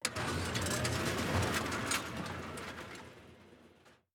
gun reload; World of Tanks

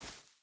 footstep barefoot grass run; Rust

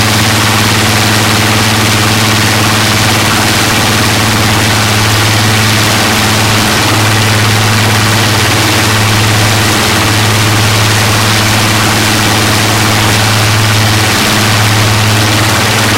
griffon side, engines; War Thunder

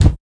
flame start; Rust

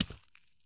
soil run; Escape from Tarkov

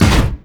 biomech run soft

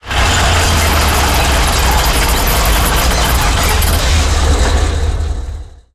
turn rattle, tanks; engines; War Thunder

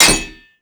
connect metal

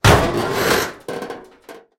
fence metal crash; tanks; War Thunder

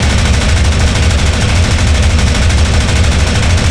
P-51D Cockpit Browning; DCS

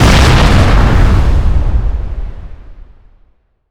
Explosions Explode Ground; DCS

